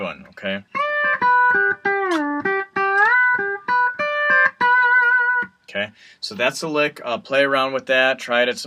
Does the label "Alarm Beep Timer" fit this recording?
No